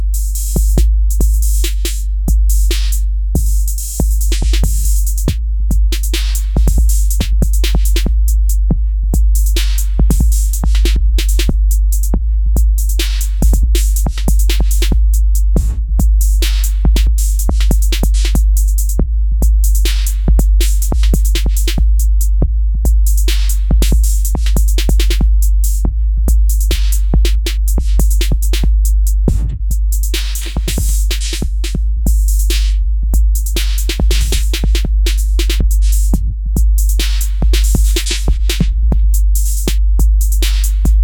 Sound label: detroit rap beat flint ty, hip hop